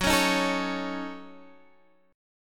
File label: Gb Minor 6th, Guitar Standard; strummed; JGuitar